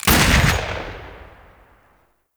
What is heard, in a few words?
High Powered Pistol; Warframe; Lex